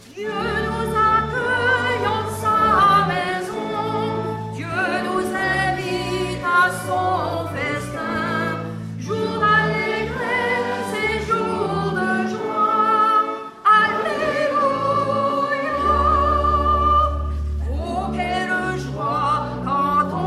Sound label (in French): chant entree